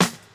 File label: vintage clear jazz funk steel snare drum sound F key, Royality free acoustic snare sound tuned to the F note